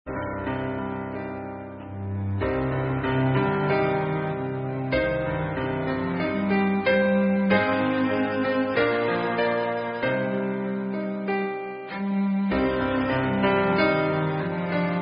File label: Shalom Chaverim, Israel, Instrumental; Girl Scouts